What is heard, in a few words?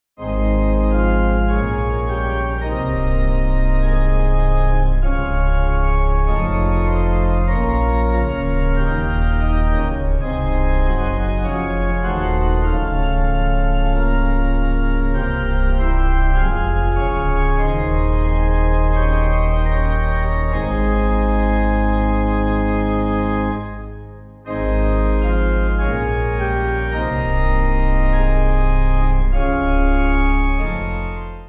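Pipe organ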